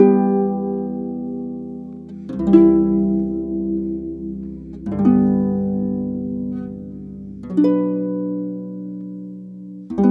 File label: Harp, Orchestral Series Rock and Pop; Sonic Foundry (Sony Creative Software); Orchestral Series VOL-4 - Rock and Pop